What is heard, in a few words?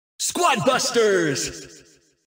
squad jingle